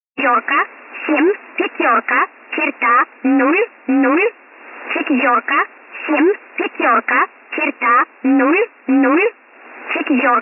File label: 16530 usb null